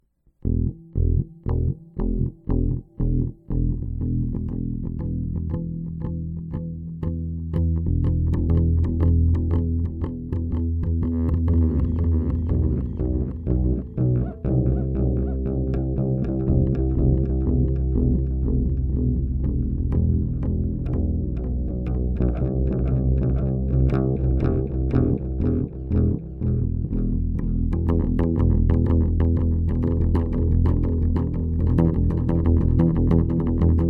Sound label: Electric bass